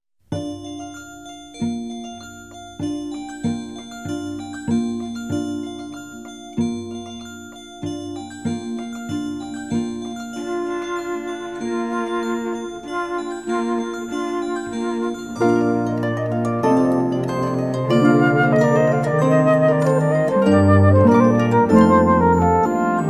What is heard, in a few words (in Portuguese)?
Dim Dom Os Sinos Tocam Instrumental